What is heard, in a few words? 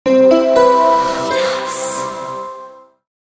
Digital Radio Plus Ident